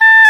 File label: OBOE, Orchestral Winds, Dry; Roland LCDP04 Orchestral Winds; Oboe 4-8; Oboe Dry 6